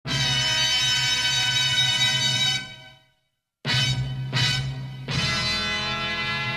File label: ringtone